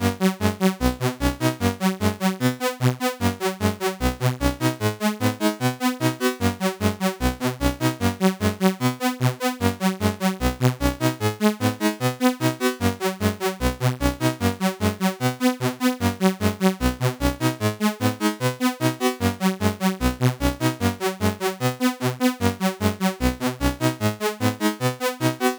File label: c64 in game; lazy jones